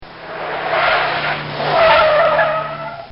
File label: Fiat Screeching By; Cars; Vehicles; Bobby Cole